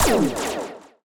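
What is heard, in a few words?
laser beam; Laser Beem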